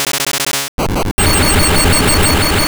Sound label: Spark